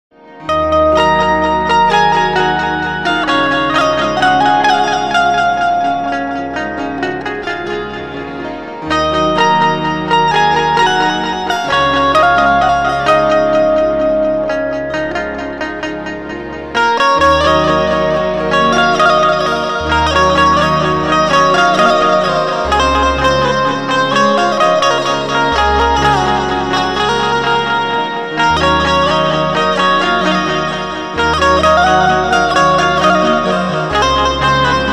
gujarati song ringtone music ringtone banjo ringtone